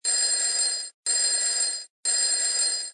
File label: alarm